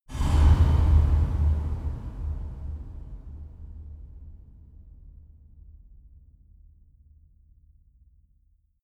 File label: reverb metal soft large